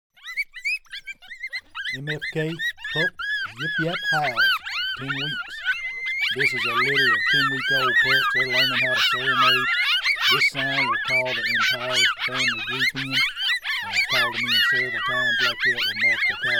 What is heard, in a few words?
Pup Yip Yap Howls 10wks; Game Calls